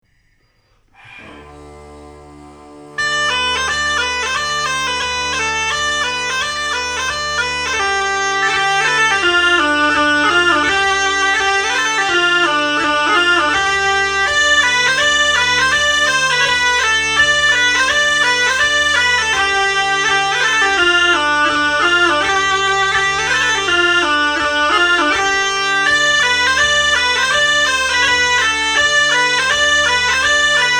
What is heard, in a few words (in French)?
Hopladeli Rythme scottisch Instr Sol